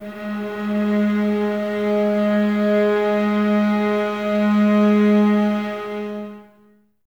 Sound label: Violas No Vib; String Ensembles; Miroslav Vitous